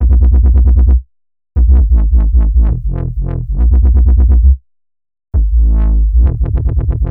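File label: Bpm135 Bass Loop A major; Prime Loops; Dubstep Illusions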